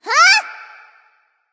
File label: nv confused; cupid